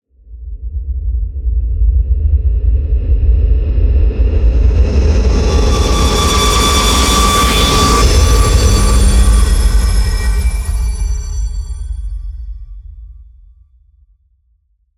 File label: riser hit train